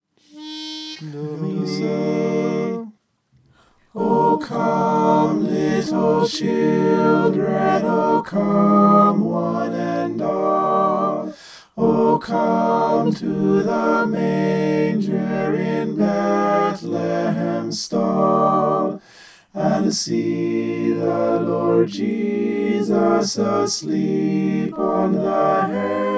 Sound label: mixed